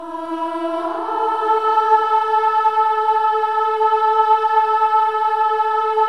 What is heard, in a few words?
Voices, INTERVALS, 4TH UP; Spectrasonics; Symphony of Voices